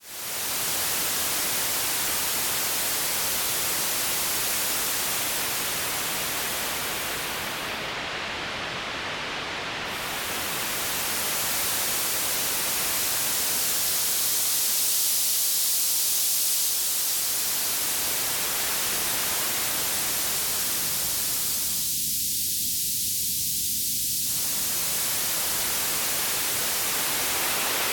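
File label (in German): EQ Weißes Rauschen; Vestax PCM5pro4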